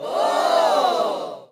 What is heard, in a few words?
reaction